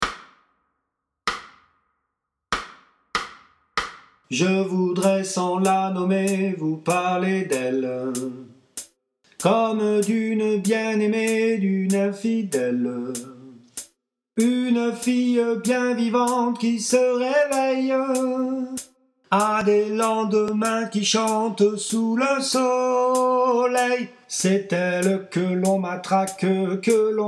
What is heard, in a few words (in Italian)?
Soprani, chorale